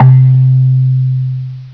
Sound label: KALIMBA